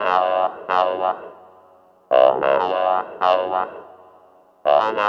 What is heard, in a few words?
Vocode FX; Sonic Foundry (Sony Creative Software) - Stylus Pressure Urban Grooves on Digital Wax; Alien Tribe